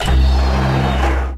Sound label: Hi-tech Panel Servo Button